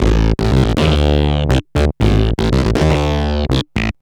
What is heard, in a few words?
Synthbass Funk, Synth Bass Loops; Sonic Foundry (Sony Creative Software); Groove Elements